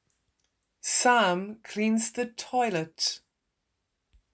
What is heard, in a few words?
toilet